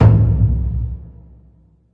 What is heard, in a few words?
big burundi, African Burundi Drums